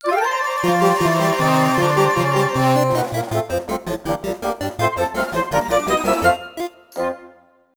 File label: Jingle, Game Clear!; pushmo 3ds ost